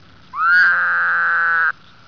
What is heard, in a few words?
woodhouses toad call; texas nature trackers; amphibian watch